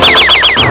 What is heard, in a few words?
teleport; Sorceress; Orko